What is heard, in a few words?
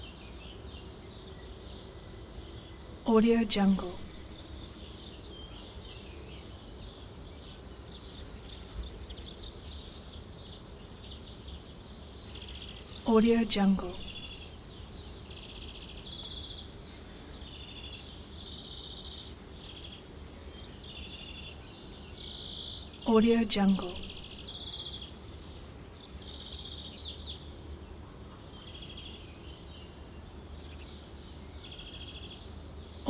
small town traffic church bells